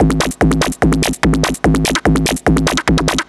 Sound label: Dance Drumloop; Drums; Dance Beats